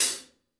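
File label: hi-hat closed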